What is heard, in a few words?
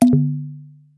event card unavailable